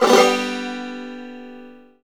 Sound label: Banjo Strum G6; CMI